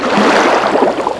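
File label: aqua elemental attack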